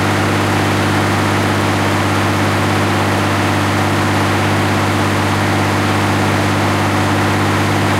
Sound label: pt76 engine int rpm 66, engines tanks; War Thunder